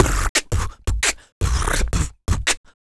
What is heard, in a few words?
Human Beat Box, Drum & Bass; 170; Loopmasters